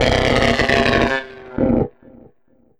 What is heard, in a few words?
DEER, HORROR; AKAI Anime Sound FX